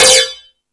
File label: Rap Session, E-MU Pro-Cussion